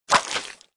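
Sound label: hit xiezi, scorpion; shouLieGaoShou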